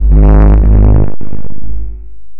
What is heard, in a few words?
energy cube; Scars FNC SFX